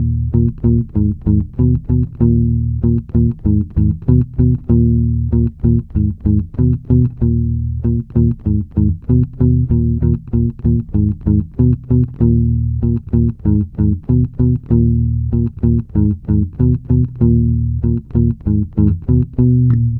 Bass loop Power 96 Ebm, Electric Bass Loops Direct; Slate Digital BASSHOLE Sample Pack